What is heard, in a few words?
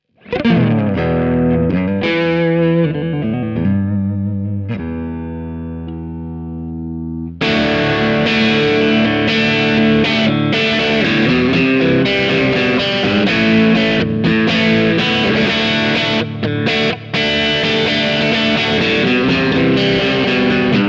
AZS2200 Prestige Neck to Bridge Alternate Switch up Crunch; Ibanez